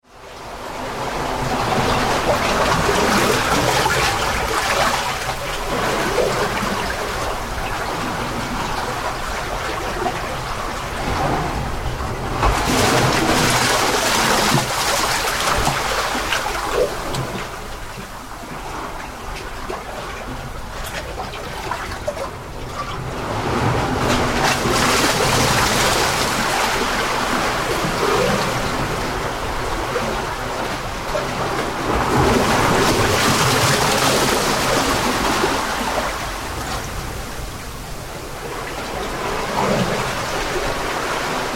Sloshing Sea, Sloshing sea inside a small rocky cove.; Ocean; Nature